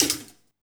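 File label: percussion, 160 BPM, drum & bass; Ueberschall; Drum & Bass Flash Trackz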